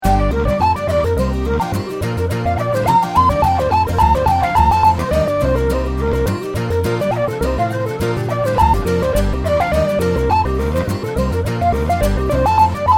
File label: loop mono